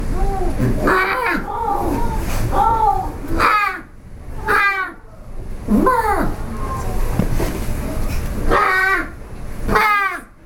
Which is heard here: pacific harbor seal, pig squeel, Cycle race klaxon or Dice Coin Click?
pacific harbor seal